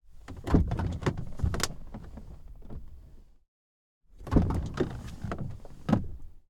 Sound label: Toyota RAV4 II 2.0 16V Diesel Foley - INT - door open; mono; Car Foley; Soundholder